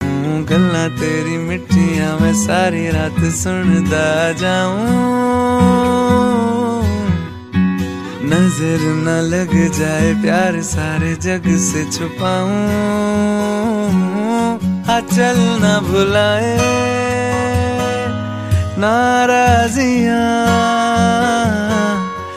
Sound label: ringtone, bollywood ringtones